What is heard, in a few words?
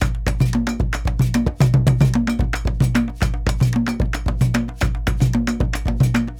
Mai, full ensemble, world percussion; Sony CS - World Percussion